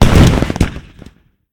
Fireworks Explode

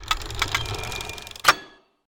Ring Puzzle; moul

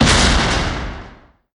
Gear Teeth Hit

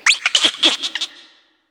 Monkey

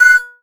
Button; moul; Jalak; KI

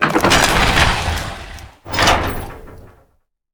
Slave Cave Gate Up; moul

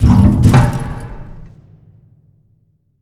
Pressure Plate Down

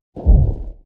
Sandscrit Eat to Walk Sniff

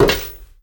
On Stone Walk; moul-assets

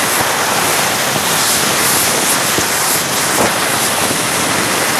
Luminous Urn Loop

